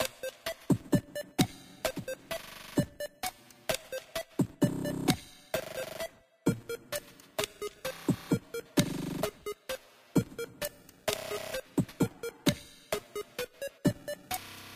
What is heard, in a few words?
access loop; Cave Story Repainted